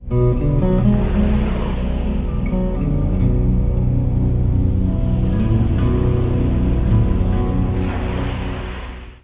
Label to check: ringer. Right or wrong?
Right